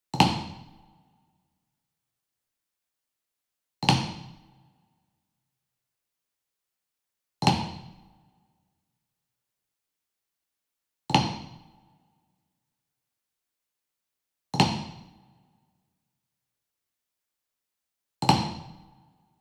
Wood Percussion Wood Block Traditional Small Resonant Flam Medium; boom library; Cinematic Strikes Construction Kit